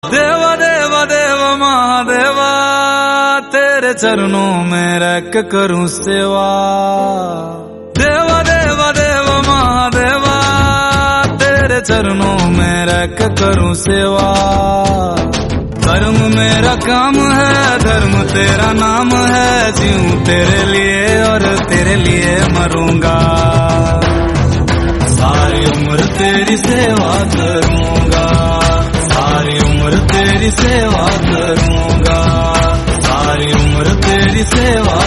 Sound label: bhakti song